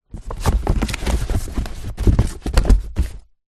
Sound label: rummage in cardboard box; foley